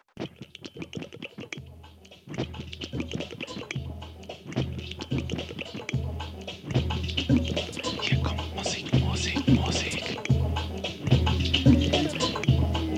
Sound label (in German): Musik Vorspiel